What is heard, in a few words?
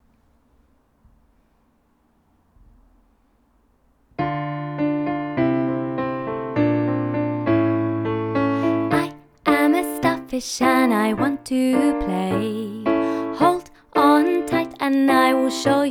with vocals; ks1 musical storytelling